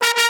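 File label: brass, Disco; Best Service ProSamples vol.48 - Disco Fever; AKAI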